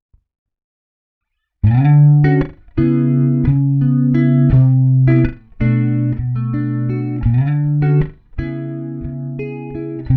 Jazz

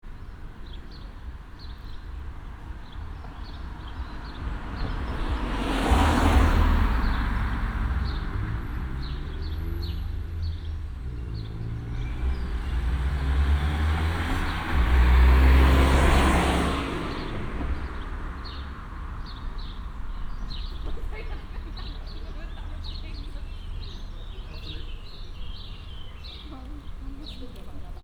DPA 4060 Binaural Street

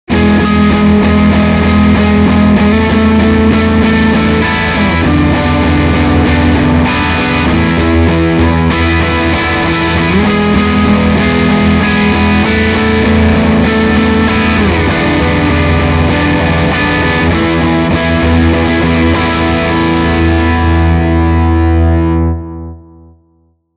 Lick

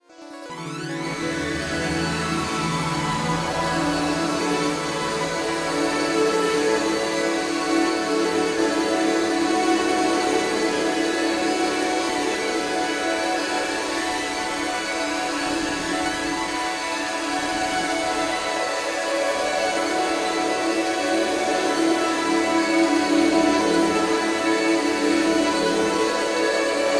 Too Much Acid; 1010music; fxbox

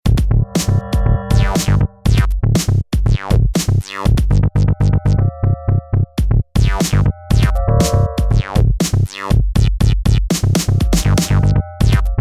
stepjump

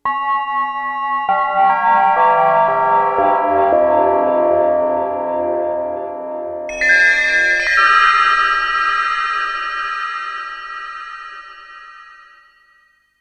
Bells

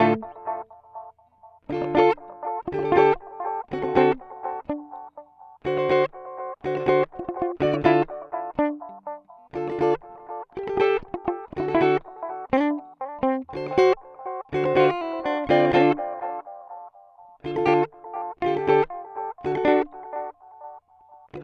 Mooer D7 X2 Tape

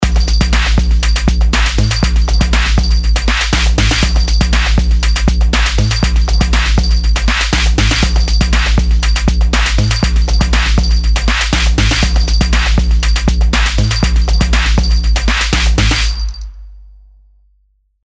Creepy Hip Hop

